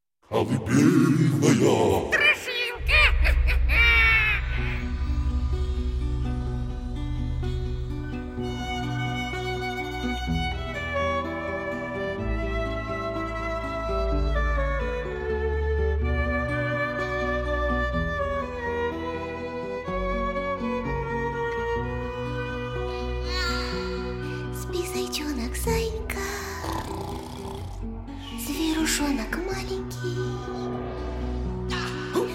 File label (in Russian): Колыбельная, минус